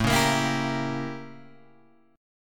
A 7th Flat 9th A, Guitar Open G; chord

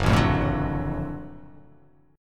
F sharp Minor Major 7th Sharp 5th F sharp down

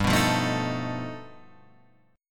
G Augmented 9th, Guitar, Standard

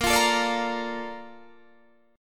Bb Major 7th, Guitar, Standard, down; BbM7 strummed; JGuitar

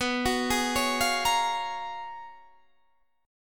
Ab Minor 13th, B, guitar chord

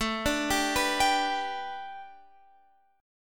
A 7th Suspended 2nd Suspended 4th Guitar Standard; x,12,12,12,12,15; A7sus2sus4 arpeggiated; capo=3

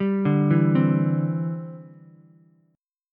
E Minor 7th Sharp 5th, Ukulele; arpeggiated; JGuitar

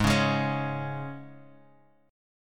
Db 5th Ab

